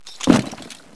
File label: belt